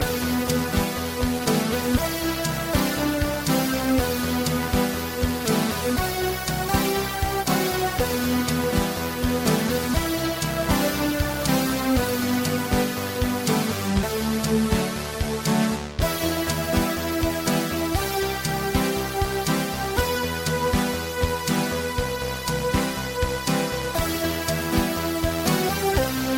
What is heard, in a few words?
retro loop